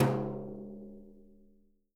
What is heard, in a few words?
BRUSHES, Living Drums